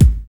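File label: BD, bass drum; X-Static Goldmine 1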